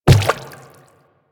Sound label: Quadruped Medium Walk Water, footsteps; Warframe; Infested